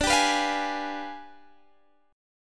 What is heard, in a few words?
G 7th Flat 9th